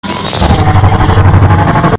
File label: roar; infected agent